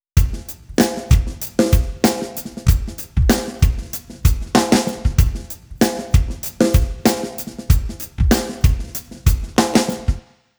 drums 110; timestretch

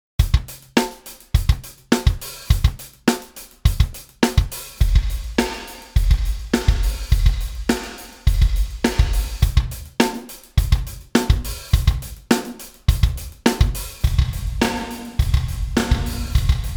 drums; quikquak; rayspace

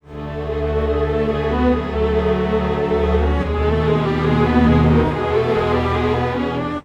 strings, timestretch; serato pitch n time pro

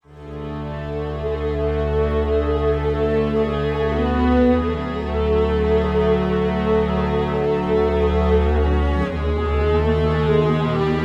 strings, timestretch

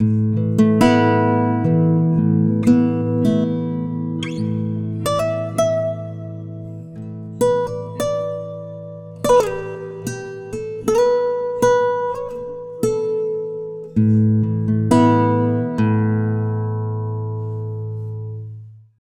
timestretch guitar; celemony melodyne

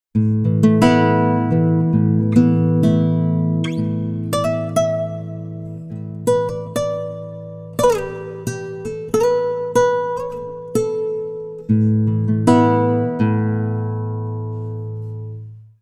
timestretch guitar

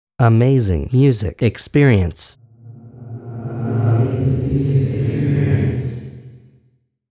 reverse vocal; quikquak; rayspace

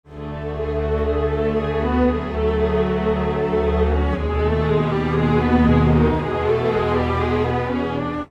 strings, timestretch

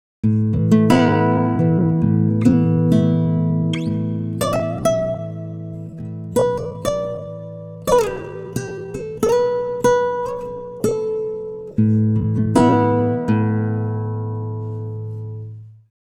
guitar, timestretch; ikm sampletank stretch

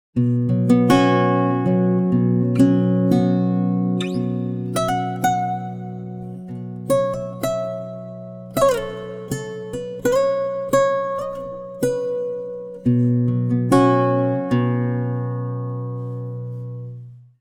guitar, pitchshift; serato pitch n time le

